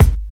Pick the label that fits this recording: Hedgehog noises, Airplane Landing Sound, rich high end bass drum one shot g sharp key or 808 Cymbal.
rich high end bass drum one shot g sharp key